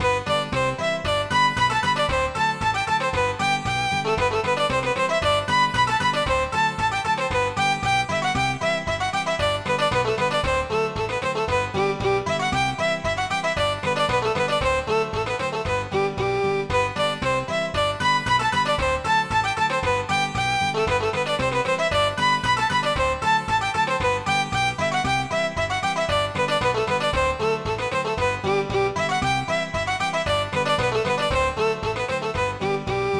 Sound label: Polka, midis